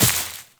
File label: Step Veg, Footsteps, Walk; Warframe; Tenno